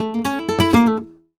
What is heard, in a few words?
Flamenco Guitar Remates De Pulgar, a